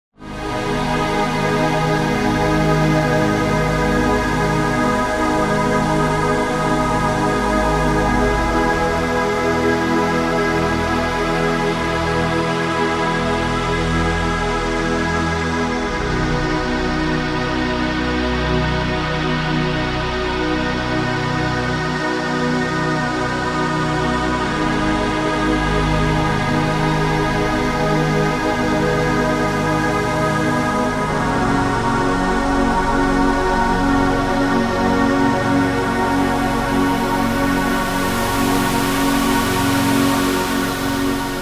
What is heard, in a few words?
virus kb zombies